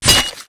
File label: Axe Shell, Damage